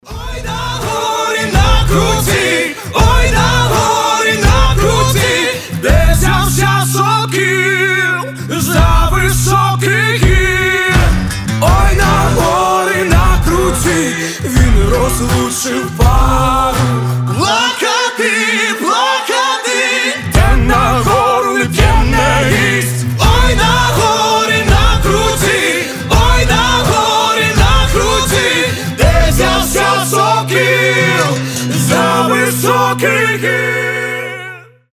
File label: acoustic version